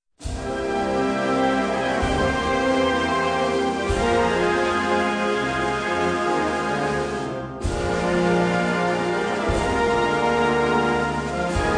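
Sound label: Czardas in C Minor, Band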